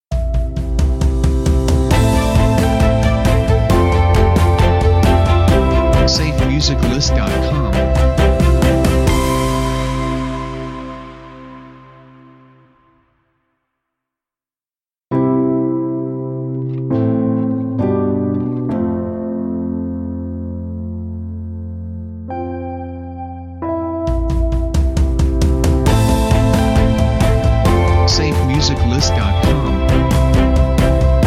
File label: Our Hopes Intros; SafeMusicList